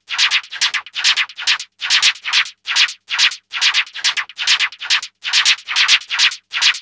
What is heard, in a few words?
NRG Percussion, Trance Drums